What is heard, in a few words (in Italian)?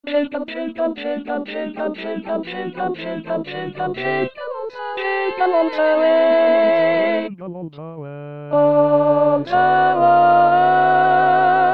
Jingle Bells 6 voci